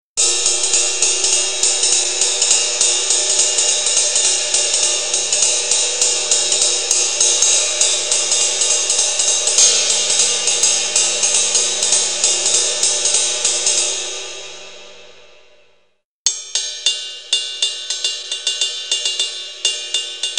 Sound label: cymbals